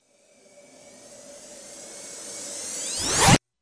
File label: woosh